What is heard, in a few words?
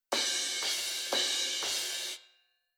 Rhythm Disco Crash